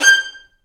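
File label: Vio spiccato G5; Digital Sound Factory Virtuoso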